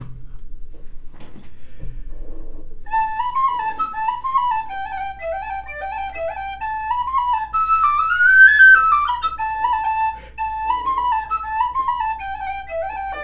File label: shush windowsills